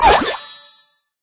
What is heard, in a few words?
fish death